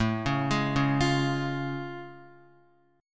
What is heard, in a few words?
A Augmented Major 7th A Guitar; arpeggiated